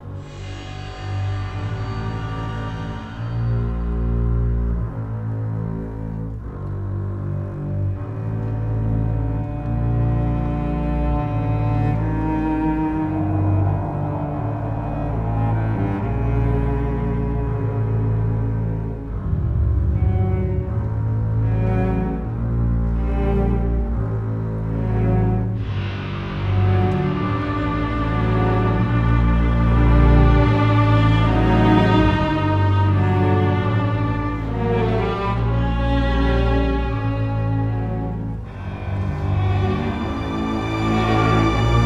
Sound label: Music Bog; Costume Quest 2